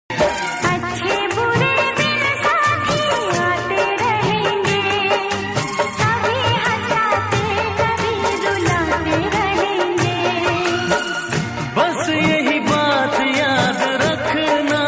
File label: Old Ringtone